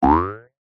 cartoon boing ascend jaw harp; zapsplat

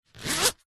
foley purse pouch leather coins inside zipper

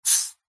technology mixing desk fader slide short fast; zapsplat

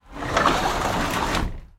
household greenhouse door slide close

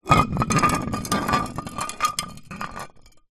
glass bottle wine roll concrete ground; foley

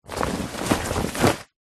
household bbq cover movement; zapsplat

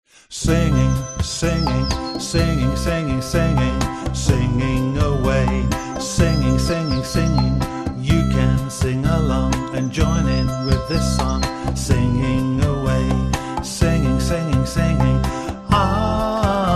singing away

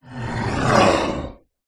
horror evil demonic growl

